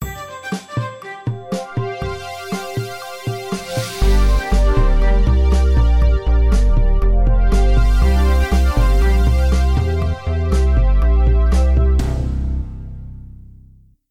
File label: Jingle